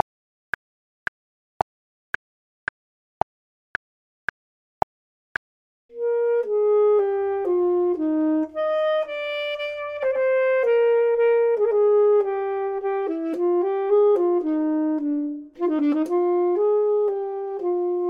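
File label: top, Saxophone and Clarinet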